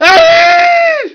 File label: ahieee; Army Men; GreenUnits